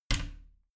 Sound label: bathroom cabinet creak close heavy; objekte; tueren